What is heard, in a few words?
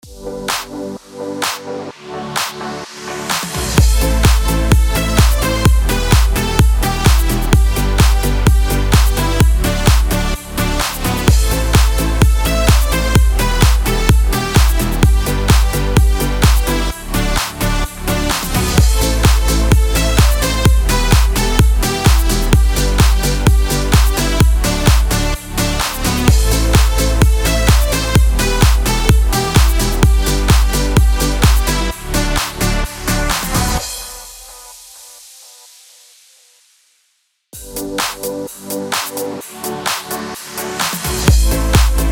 BED ELECTRO HOUSE AMIN 128BPM